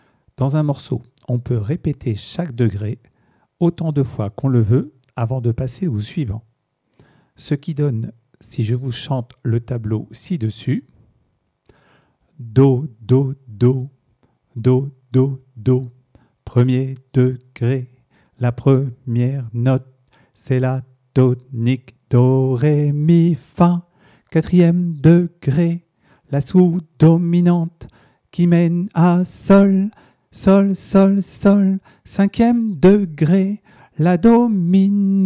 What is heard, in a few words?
Blues I IV V I